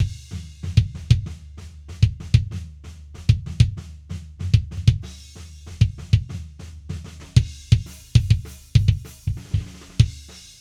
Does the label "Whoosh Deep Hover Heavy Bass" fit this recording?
No